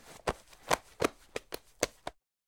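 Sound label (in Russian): дружелюбные хлопки по спине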